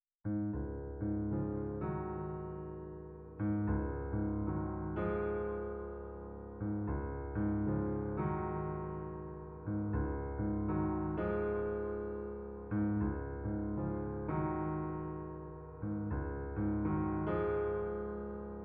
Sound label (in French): passe muraille accompagnement